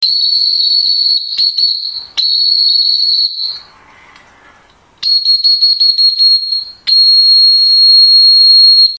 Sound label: command dog